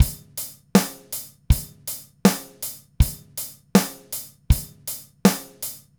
Green Maple Groove 80, Vital Drums, DW Green Maple Kit, 80 BPM; Sonic Foundry (Sony Creative Software)